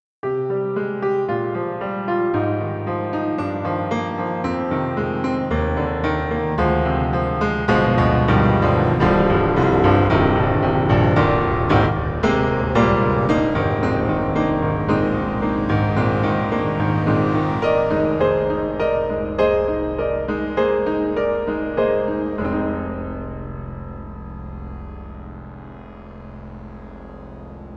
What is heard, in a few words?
Berlin Pianosphere; KORG KRONOS 2